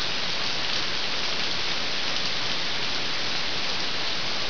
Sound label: rain